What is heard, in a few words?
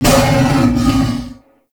Wolf Bite; Animals; Duviri